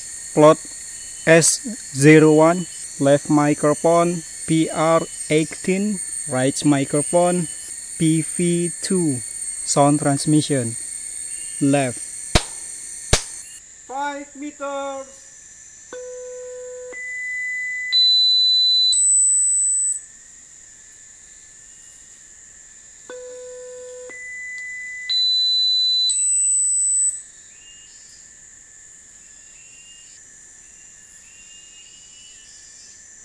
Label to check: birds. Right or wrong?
Right